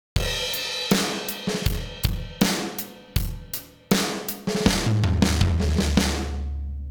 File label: drum DECAPITATOR